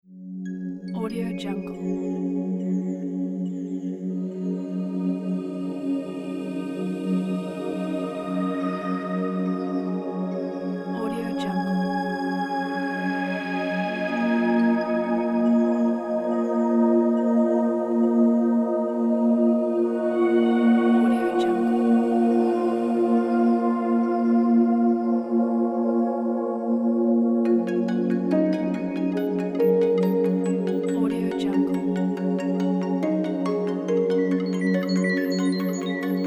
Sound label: cinematic landscape